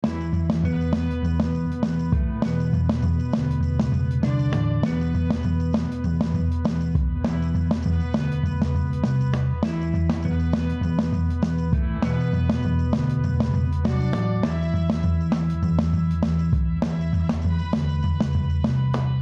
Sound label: Win Match; Kings of Kung Fu